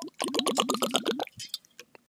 glurp; midnight guns

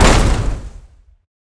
ssg fire stereo; midnight guns

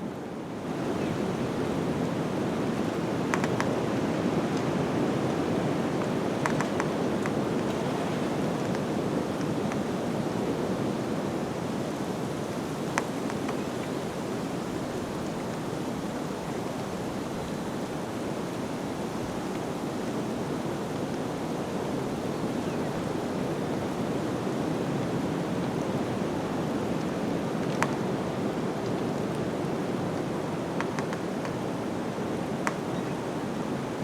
amb winter; midnight guns

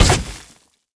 armor shed; impact; midnight guns

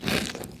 foot snow, footsteps; midnight guns

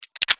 snap shut, pistol; midnight guns; gpistol

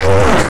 pain, player; midnight guns

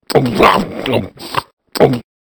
eat; food; midnight guns

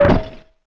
land metal, footsteps; midnight guns

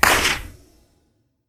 whiz big; impact; midnight guns

